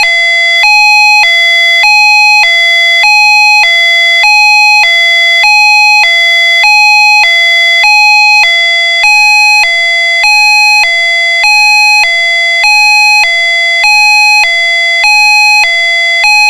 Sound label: special warning